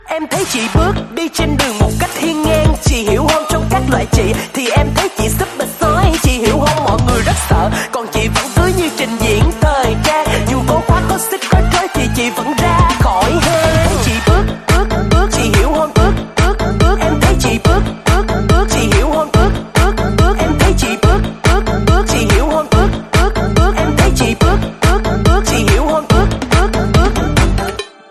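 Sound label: ringtone remix; nhac chuong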